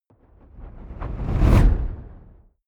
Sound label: fire whoosh tonal; swooshes-swishes